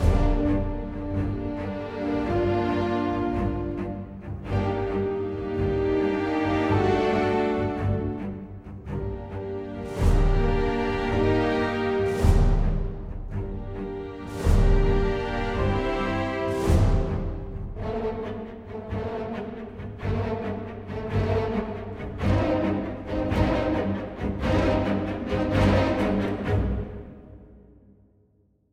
Combat Basalt2 Threat2 135 Gm

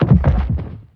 Body Shuffle

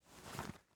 Backpack Shuffle

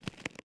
Clothing

